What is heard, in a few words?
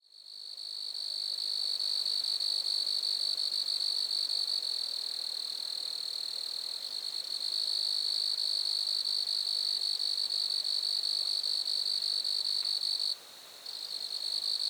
criquets grasshoppers lac; montcerf-lytton